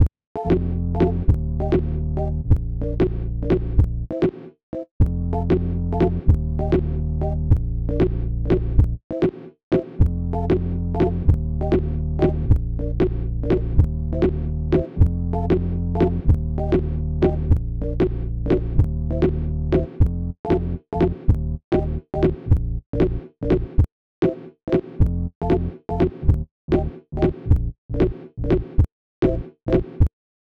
sinewave

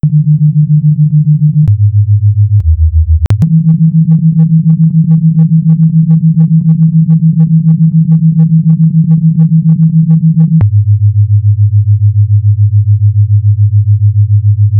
SINEWAVE

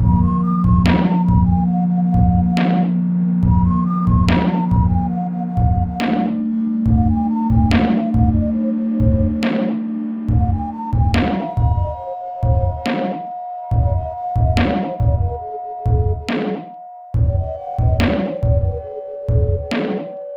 sinewave

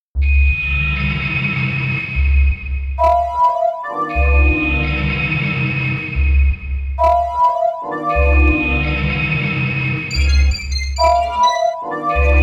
Sine Wave

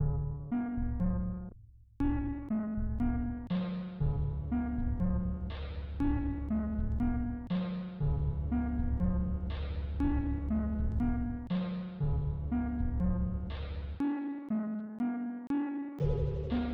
sinewave

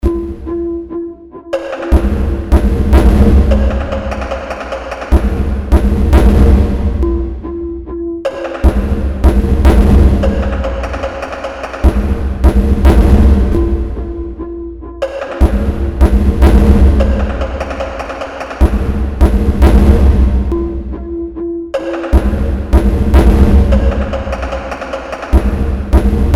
sine wave

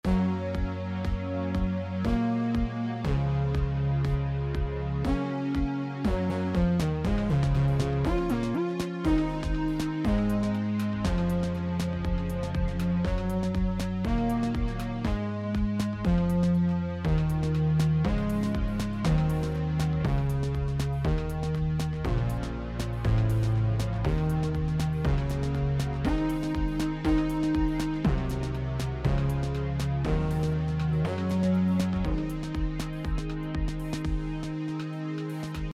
virt. inst

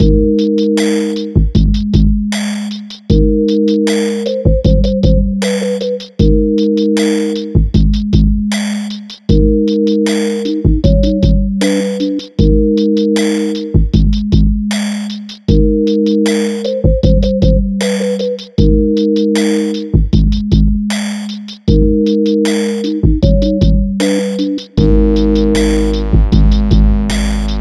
sine wave project

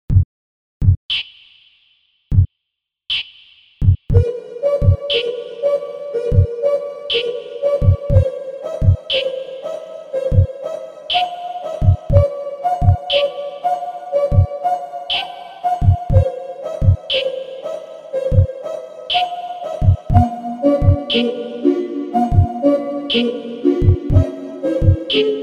Sinewave

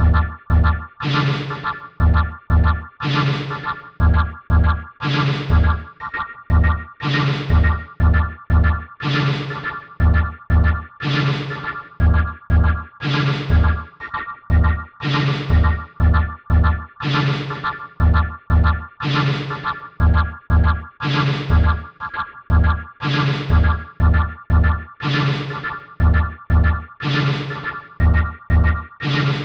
sine wave

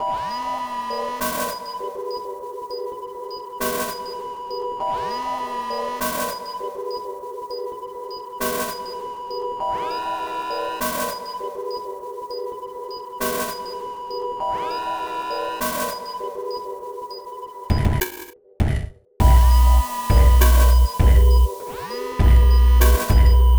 Sinewave

